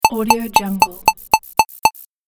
perky timer bleeps

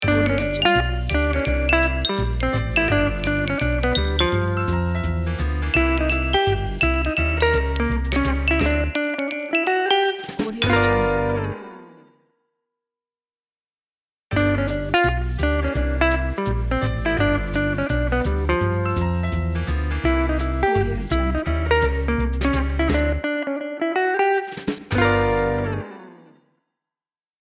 jazzy quintet logo; drtimwaters

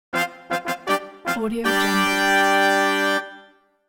gladiator fanfare

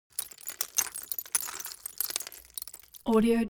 object glass debris fall sequence short; smartsoundfx

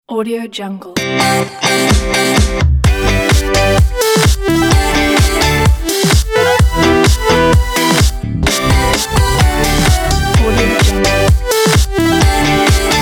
energetic and fun; pulltrack

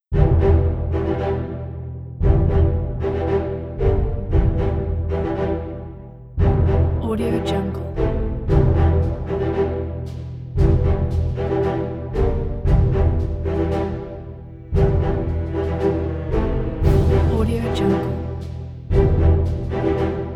military operation

